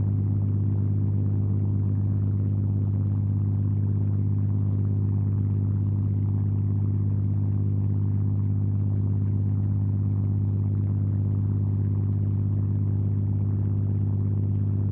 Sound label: plane; World of Tanks